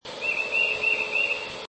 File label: Titmouse